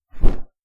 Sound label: Canvas Dropcloth Snap